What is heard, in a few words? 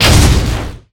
multi shot; MUDevs S20.1-3